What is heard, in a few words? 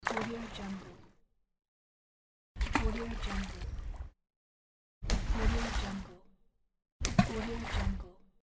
splash